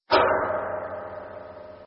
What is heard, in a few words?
Metal Stress; docks